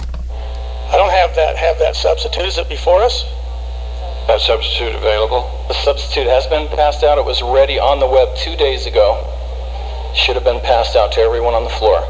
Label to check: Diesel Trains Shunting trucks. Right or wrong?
Wrong